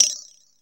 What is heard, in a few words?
ANALOG BELL; Zero-G; Ambient 1